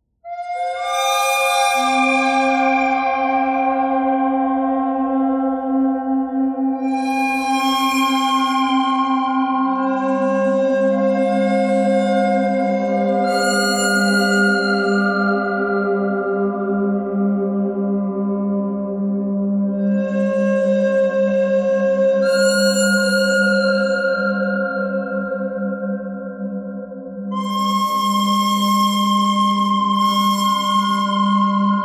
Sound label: bird thread simple